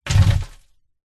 horror coffin mud dirt small stones hitting coffin box being burried internal; internal coffin pov